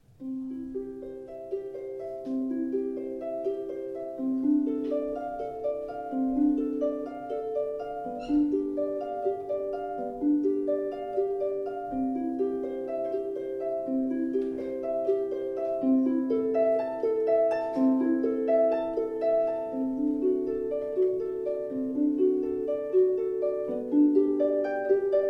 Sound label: Prelude C Dur, harp; J.S.Bach